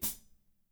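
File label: HAT, drums; Spectrasonics; Burning Grooves CD2; DRY KIT 4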